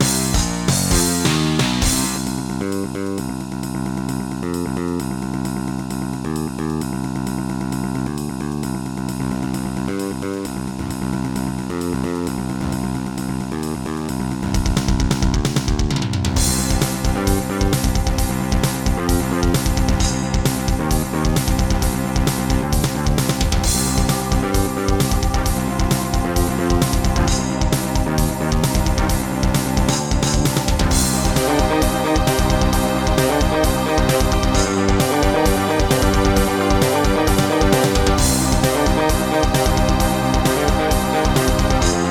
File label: Casio LD-80; sigil doom episode 5